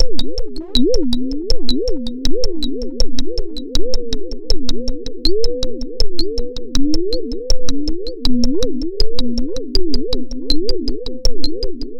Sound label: Alien whistle